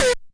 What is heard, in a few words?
cyborg Quick Saw; Cyborg Kit